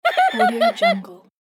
cartoon idiot laughter

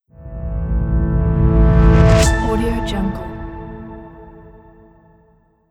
logo reveal

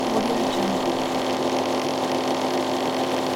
scifi clunky ventilation system loop; stormwaveaudio

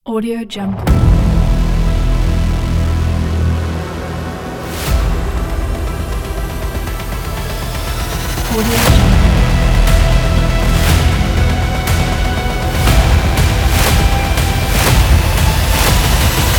hybrid logo

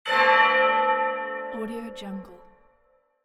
church bell

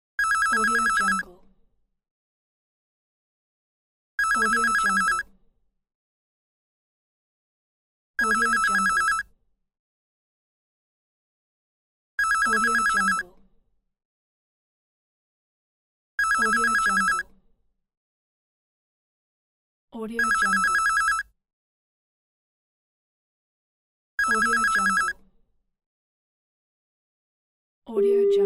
telephone ringing bell

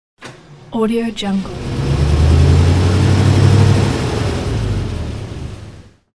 motor start and stop; catsoundstudio

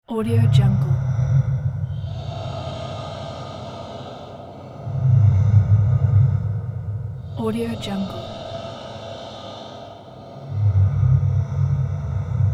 horror breath

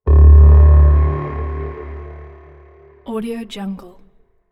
deep ambient bass; sinematic 2; soundmorph

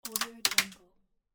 kitchen door knob

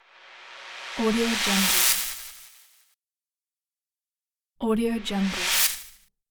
white noise transition whoosh

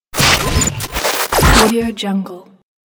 radio static glitch

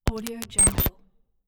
glitch; bogdansfx

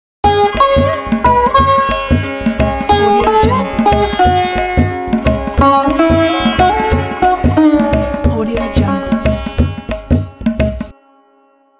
indian middle east logo

